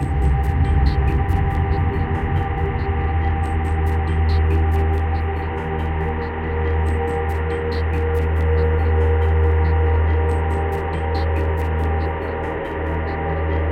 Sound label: ambience; Mirror's Edge (2008)